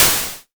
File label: SNR, SCI Prophet-5 synth drums